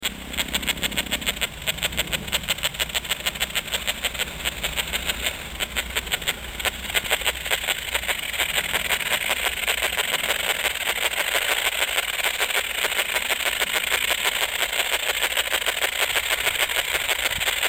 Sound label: Tessellana veyseli; Wienfluss; Wreitmeier